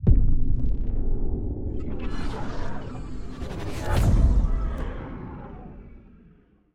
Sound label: Skybox Portal Open Distant